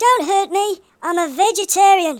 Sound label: ouch, Hippy